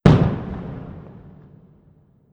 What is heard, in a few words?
rolling